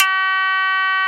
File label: Shamison Bb2